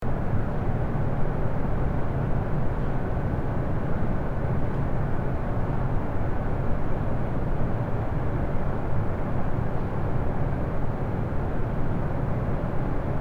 Large hallway constant humming and ventilation loop